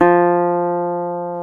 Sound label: GTR, Dobros, National